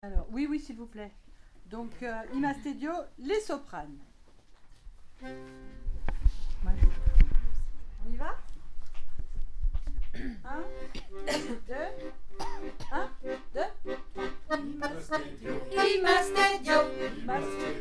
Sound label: soprani